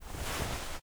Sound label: FABRIC Movement Short; mono; Universal Sound FX; CLOTHING